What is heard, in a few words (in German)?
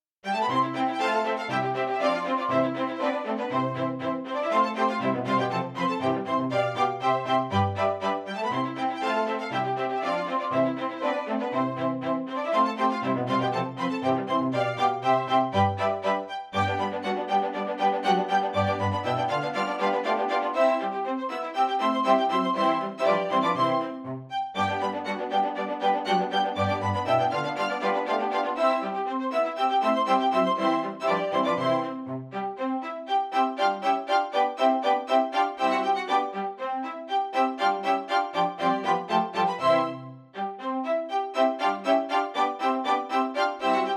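Contretanze fur zwei Violinen und Bass; Zwolf Contretanze; Tanz VII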